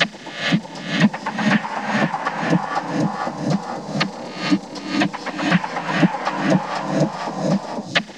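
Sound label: infini loop, vocoder FX loop; Zero-G Ambient 1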